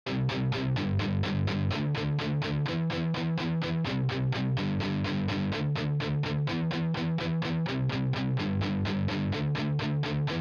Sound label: guitar stem